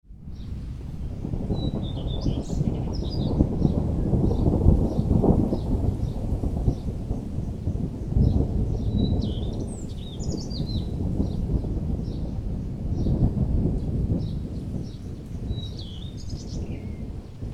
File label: Thunder sounds no rain